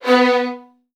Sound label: B1, VN 16TH, Virtuoso Strings; ILIO; Kirk Hunter